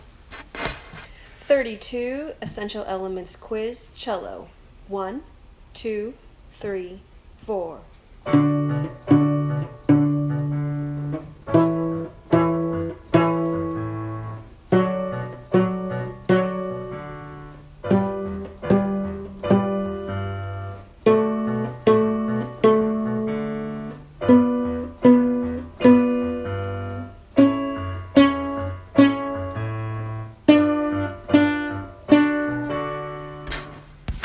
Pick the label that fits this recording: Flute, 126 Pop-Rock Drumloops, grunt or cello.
cello